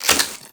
Quick Draw Mech; weapons; Warframe; The New War; Omicrus

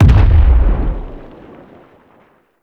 Golem Step Far; Warframe; Plains; Footsteps

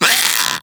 Inf Splitter Split Top Vocal Pain, Infested; Warframe; Grey Strain

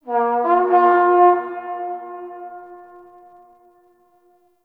Battle Trumpet Horn Call; Warframe; Tau

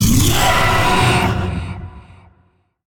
Vocal Accent; Dialog; Warframe; Zariman; Void Angel

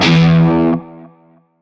Shawzin Oct One E; Music; Warframe; Narmer Shawzin

